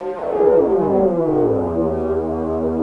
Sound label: Monotron Sci-Fi